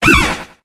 Grito de Flapple

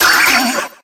Grito de Ferroseed

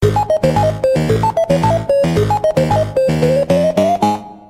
Tema de victoria; Pac-Man; SSB4

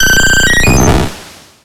Grito de Vaporeon

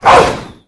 Grito; Boltund

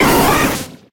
Grito de Weepinbell; Actualizando grito en la sexta generación (XY)

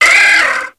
Grito de Cubone

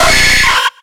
Grito de Nidorino

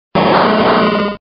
Grito

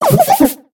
Grito de Swadloon

